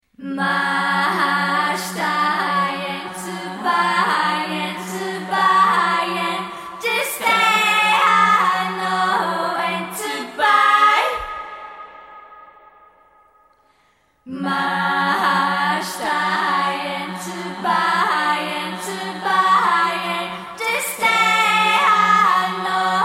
bgm

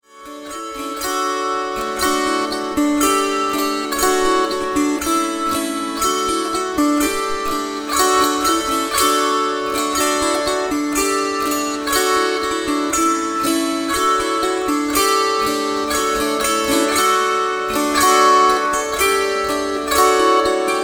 kantele

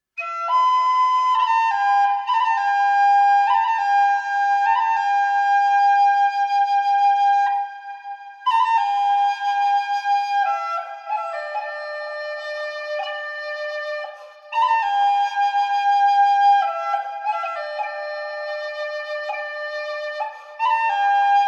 singingtree

